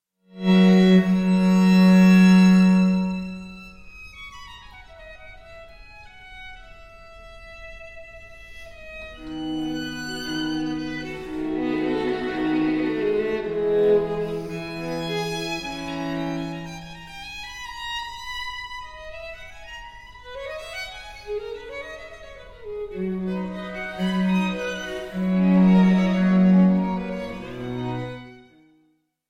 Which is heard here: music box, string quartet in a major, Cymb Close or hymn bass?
string quartet in a major